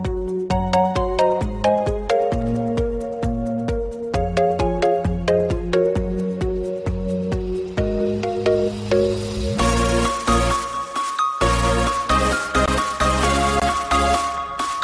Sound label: Tik Tok Remix Ringtone